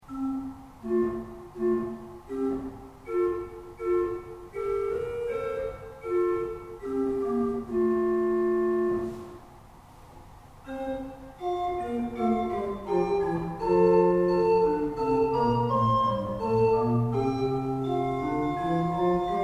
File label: Crescendo